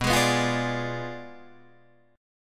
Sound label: B Diminished 7th B down Guitar Drop D